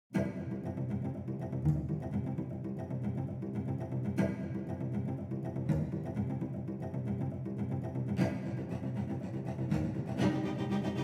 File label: Cellos and Percussion